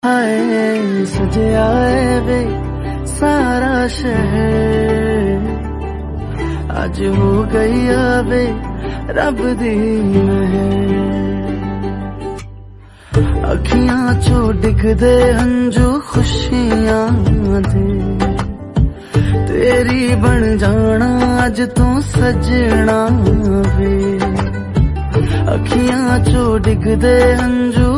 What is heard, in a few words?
Song Ringtone